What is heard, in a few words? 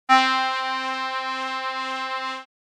Voice